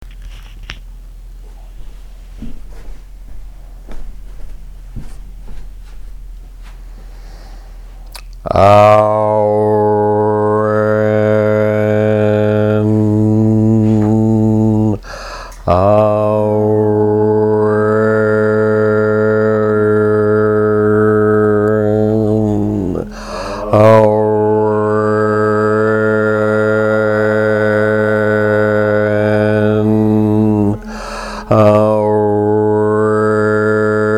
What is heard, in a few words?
chant; descent into the mound